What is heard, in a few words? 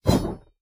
whoosh; Smite; Sobek